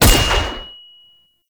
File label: Pistol Last Shot; weapons; Warframe; Grineer; Orokin